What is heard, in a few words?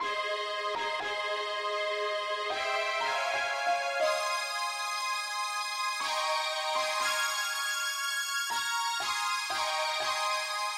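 mandolin Lead key A flat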